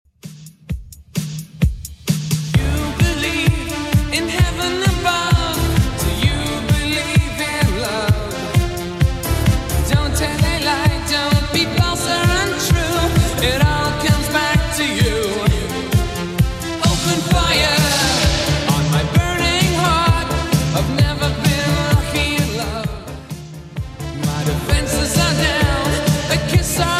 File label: 80s Redrum 130bpm